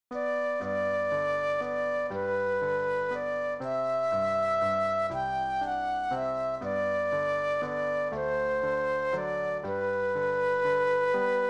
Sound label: Flute Solo; christian solos